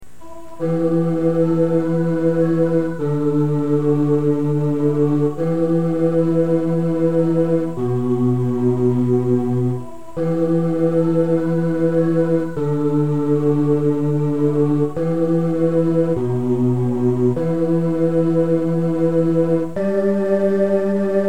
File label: greensleeves men